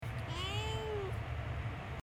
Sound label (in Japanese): 鳴き声